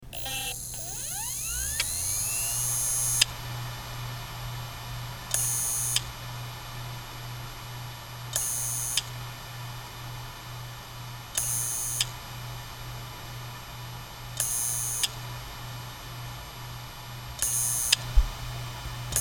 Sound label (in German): Maxtor Diamont Max, Festplatten Geraeusche